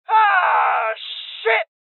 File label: Injury Male Young; Voices Injury MaleYoung